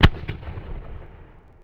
distant cannon; WAR

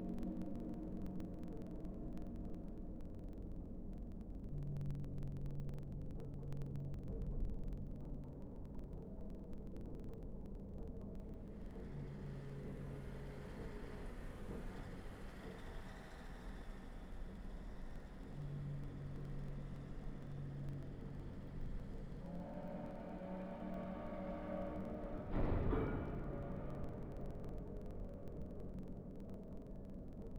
factory; WAR

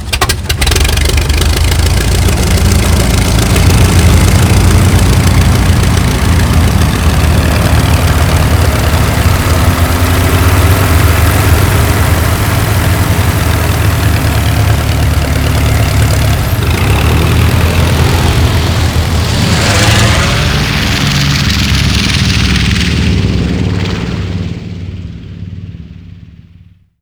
Henschel taxi; WAR

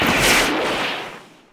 bazooka fire; WAR

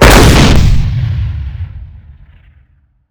105mm shot

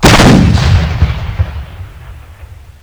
tiger cannon fire; WAR

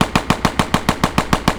m3 loop; WAR

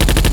mg42 loop; WAR

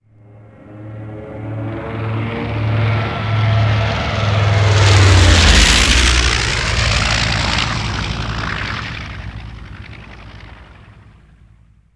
fly by; WAR

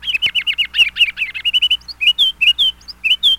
kinglet; WAR

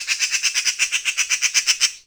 magpie

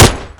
30cal; WAR

